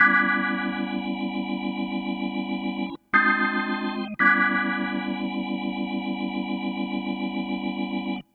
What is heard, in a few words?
Cruisin' Disco Organ, 70s Analog Funk; Sonic Foundry (Sony Creative Software); What it Is!